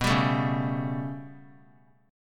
B 7th Suspended 2nd Sharp 5th down, chord; B7sus2#5 strummed; JGuitar

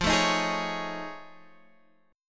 F 7th Sharp 5th Sharp 9th F; strummed; chord; JGuitar

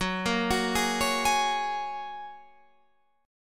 Gb Major Add 9th, down, Guitar, Standard; arpeggiated; JGuitar

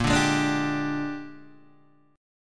A sharp 13th, Guitar DADGAD

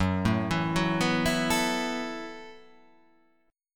F sharp Minor 11th, guitar

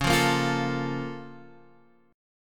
A sharp Minor Major 7th

